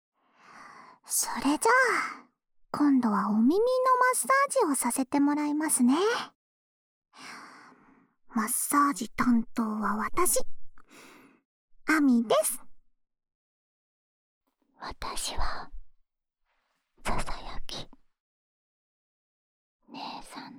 両耳同時にマッサージと囁き, ASMR

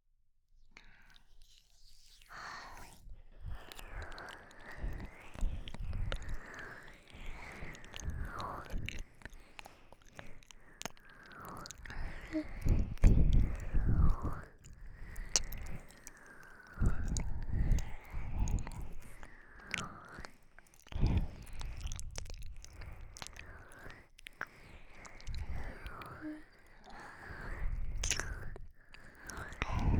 ぺろぺろ右 マッサージ左

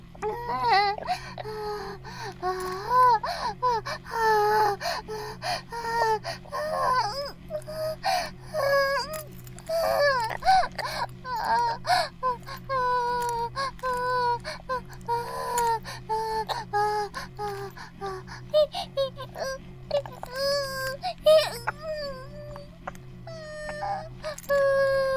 バイブ放置; イクたびにわけて

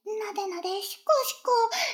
シコシコ音声; 逆時間停止されて

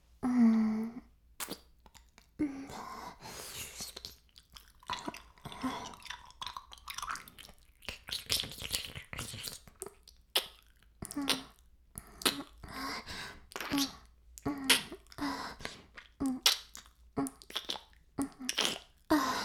耳舐め音声, ASMR; 白くて黒い魔性円環

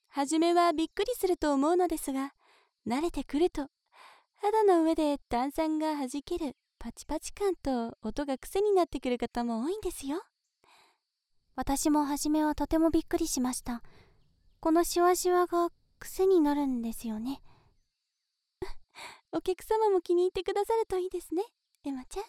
炭酸耳マッサージ, ASMR

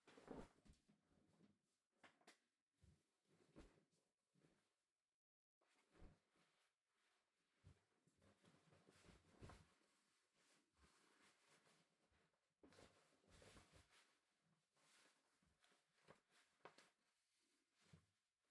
ズボンを履く; KU100; 色々な動作

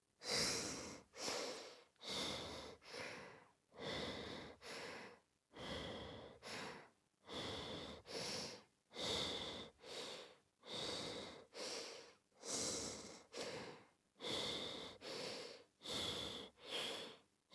エッチな 呼吸音 ボイス; 桔梗

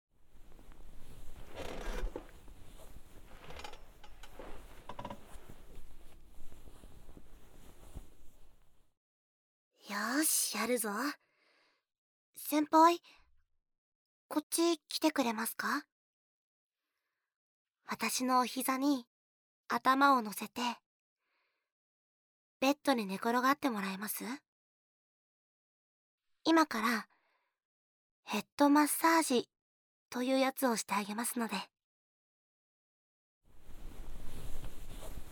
アイドルの 上半身 マッサージ, ASMR; １年A組・豊月シロミ編

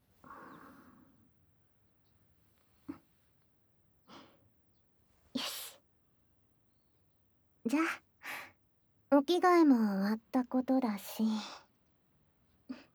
ふわふわ 泡で まったり 髭剃り; 朝の支度♪

